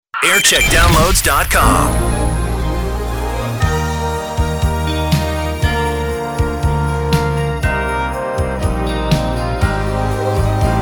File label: Christmas Jingles